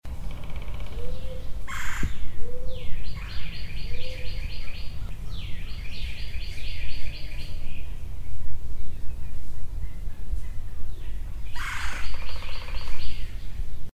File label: hammock, am